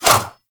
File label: Prime Dagger Whoosh; Dual Daggers